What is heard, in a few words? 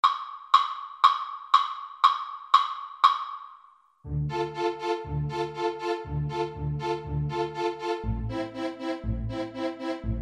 march, recorder